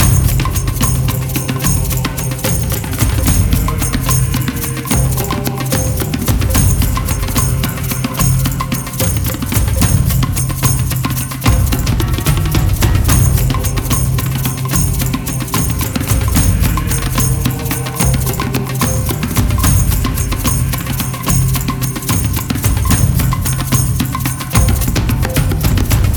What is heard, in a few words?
Garden Main LOOP